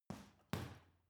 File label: boxing punching bag side hook double soft